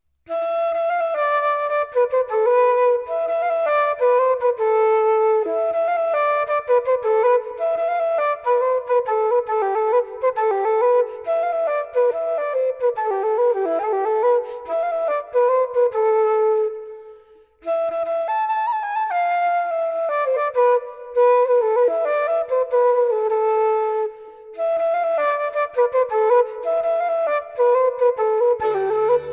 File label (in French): Gavotte Bal